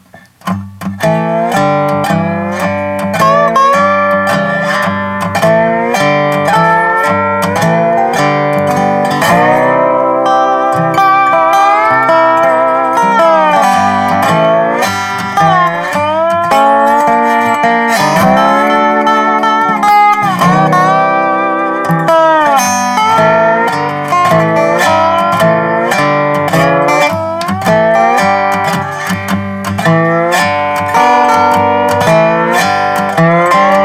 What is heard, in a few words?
Dobro FX